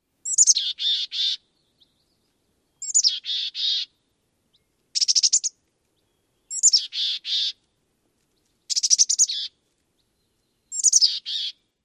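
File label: calls, mountain chickadee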